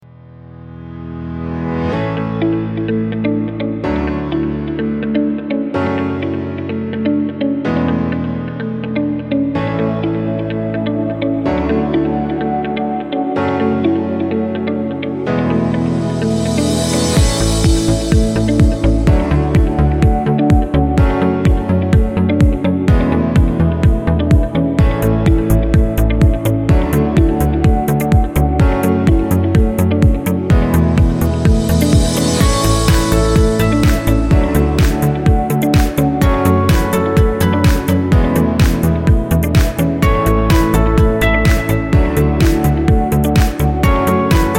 corporate inspirational

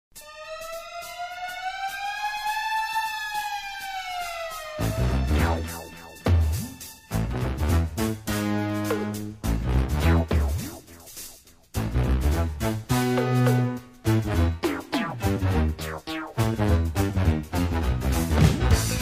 Theme, Instrumental; Inspector Gadget

